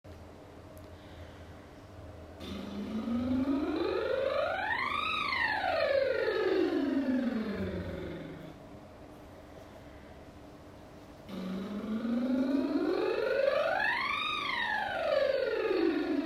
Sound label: Lip buzzes